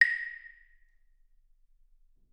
clave; dsp2008 sound library

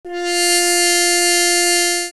Hand Mute f4, horn; dsp2008 sound library

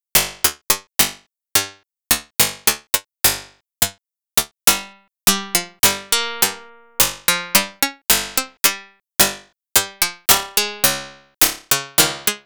Plucker Jam

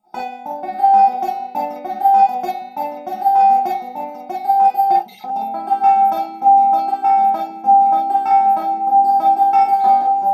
banjo through dobro minus piezo

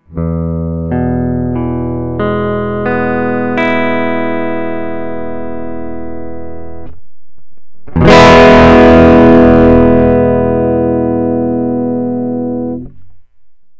diode e chord

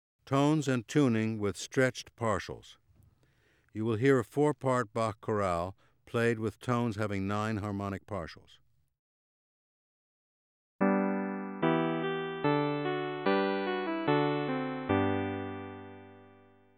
Tones And Tuning With Stretched Partials

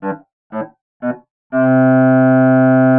stacatto

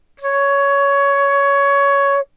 flute

